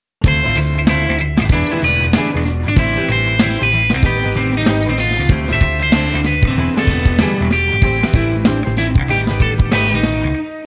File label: shuffle